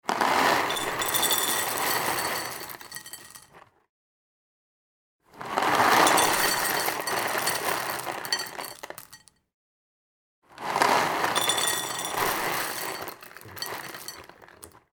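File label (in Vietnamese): Tiếng đổ ngũ cốc khô vào bát ly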